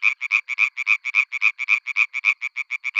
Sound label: European Tree Frog